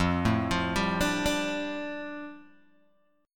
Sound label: F 6th Add 9th Guitar Open G, chord; 3,2,3,0,3,0